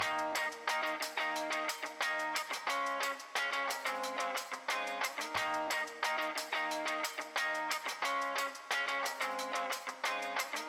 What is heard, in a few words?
BGM Paytable; Victory Lane